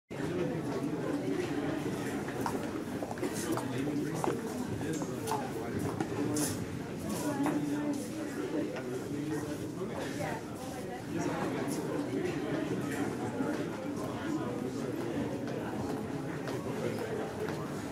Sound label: School administration hall; سالن اداری مدارس